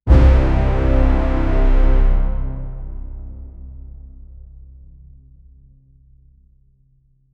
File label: aggressive cinematic brass